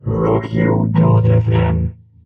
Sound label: vocal, jingles